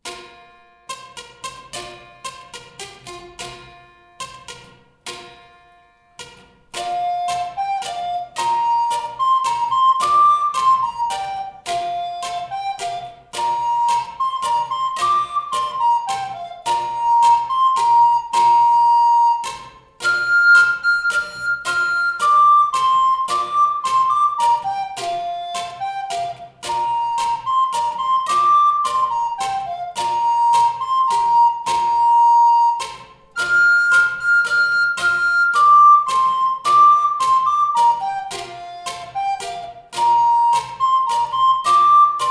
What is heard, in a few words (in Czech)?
flétna + preparované cembalo; Arirang; Flautoškola 2 – METODICKÉ NAHRÁVKY a DOPROVODY